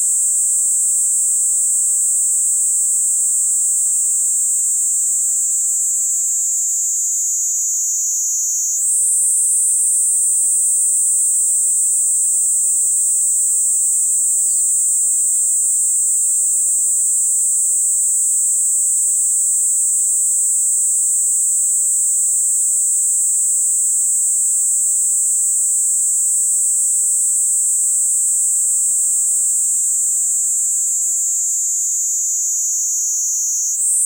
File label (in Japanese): ニイニイゼミ